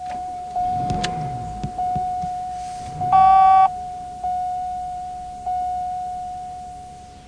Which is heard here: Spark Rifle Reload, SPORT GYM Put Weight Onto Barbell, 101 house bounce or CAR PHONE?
CAR PHONE